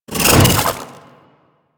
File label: Jump; Warframe; Corpus; Arachnoid Wraith; Abilities